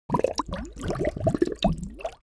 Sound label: Cartoon bubbles; bubble sound effects